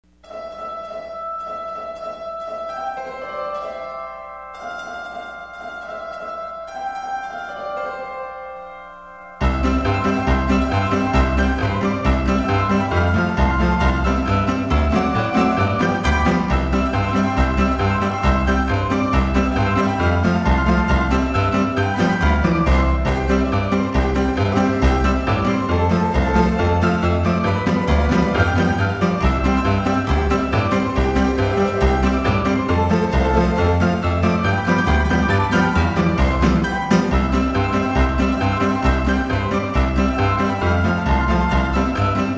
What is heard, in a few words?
Jingle Bell Rag 160, piano